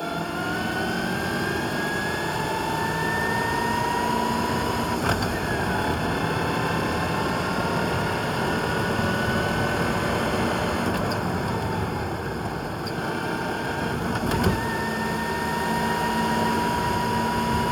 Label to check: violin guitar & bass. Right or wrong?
Wrong